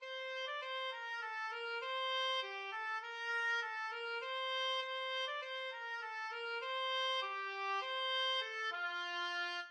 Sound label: London Bridge, oboe